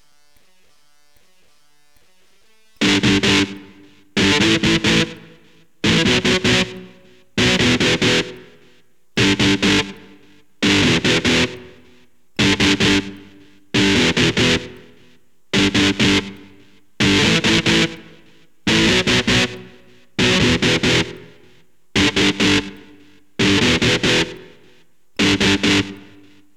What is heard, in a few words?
E GTR POWER CHORD